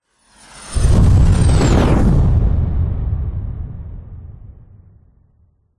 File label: vehicles plane fighter jet burst into flames passing